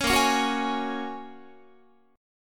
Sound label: A sharp Minor 7th Sharp 5th, Guitar; strummed; 16,18,16,16,17,19